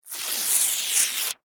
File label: Office Paper Tear Slow Rip; Materials